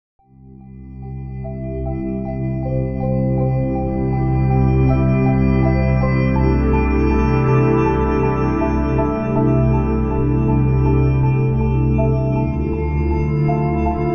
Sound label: Coasting Along on Warp Drive